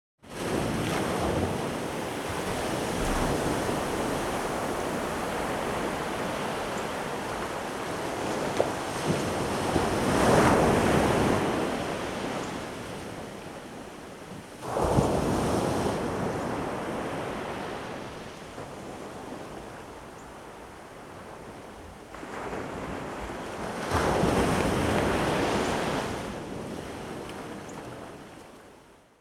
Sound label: Surf